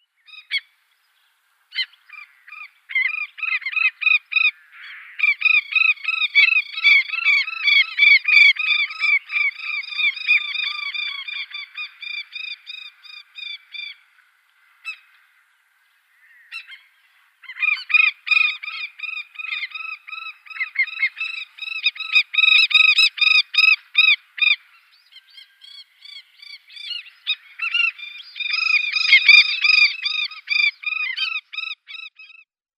African Skimmer

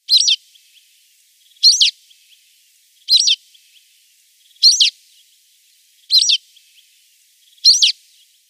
Rock Sparrow nestlings

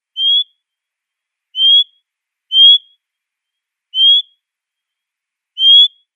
Northern Anteater-Chat calls

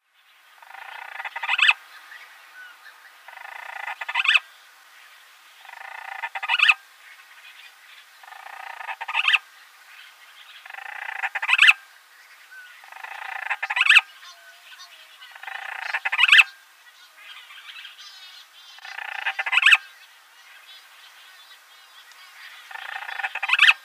Madeiran Storm Petrel

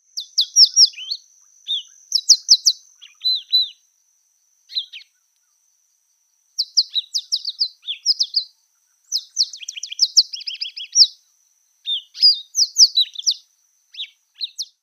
African Pied Wagtail song

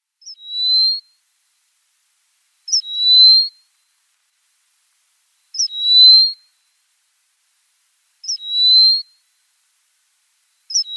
Bar tailed Lark song

